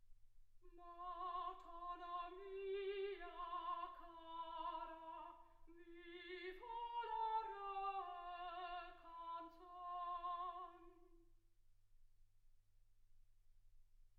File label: soprano